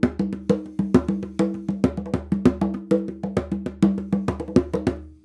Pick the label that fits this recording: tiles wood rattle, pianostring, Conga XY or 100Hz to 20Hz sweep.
Conga XY